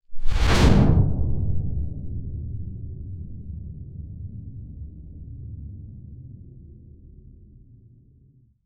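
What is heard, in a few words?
heavy viscous liquid splas; textures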